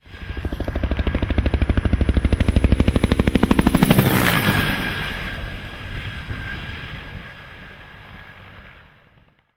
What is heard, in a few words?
low pass, aircraft; Civ; Vehicles